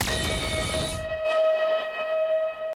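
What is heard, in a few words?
Beam with Echo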